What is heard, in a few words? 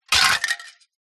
foley plastic thin broken shards drop; zapsplat